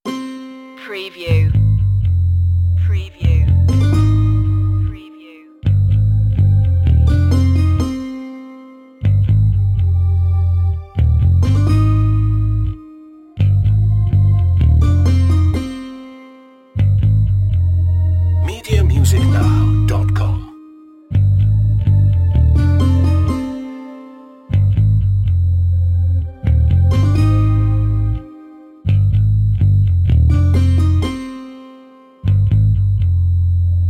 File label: no drums, urban rnb downtempo